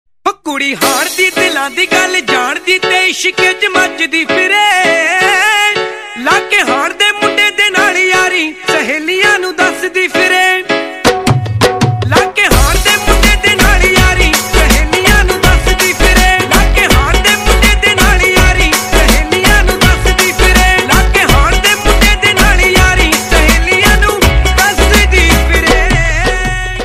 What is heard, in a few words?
punjabi ringtone